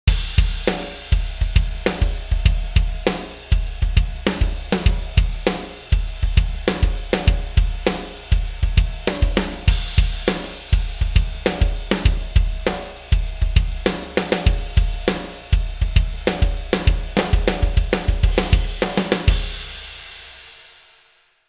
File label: drums; universal audio moog multimode filter xl